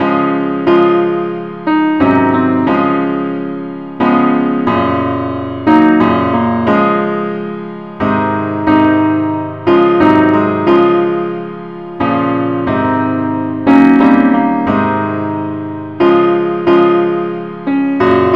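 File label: simple accompaniment key d flat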